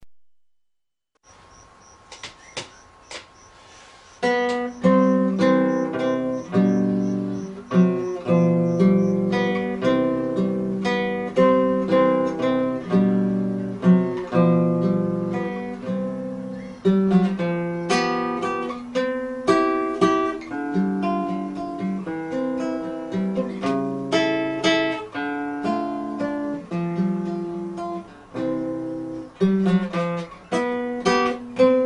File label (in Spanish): vals; lewis alastair